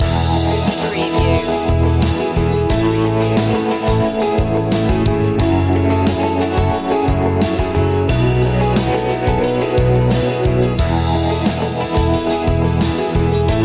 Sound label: acoustic driving day loop